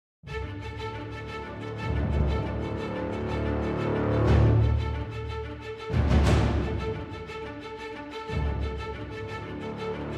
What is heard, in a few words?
Orchestral Essentials; CineSymphony LITE; recorded at the SONY Pictures Scoring Stage; Cinesamples